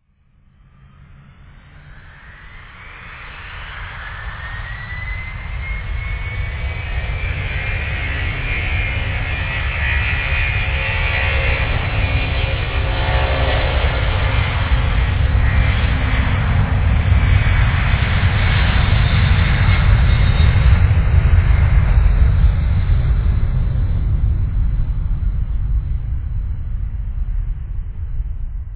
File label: dc-10 takeoff